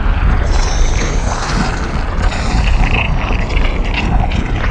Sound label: Lava loop; forsaken